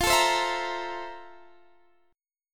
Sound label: F Major 11th Guitar Half Step Down